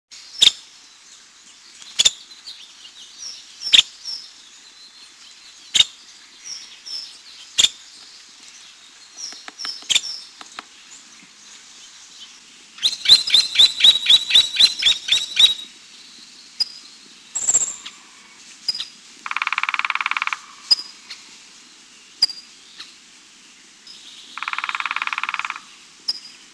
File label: nuttalls woodpecker